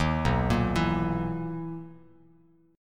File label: B Major 11th B Bass Standard; BM11; arpeggiated; JGuitar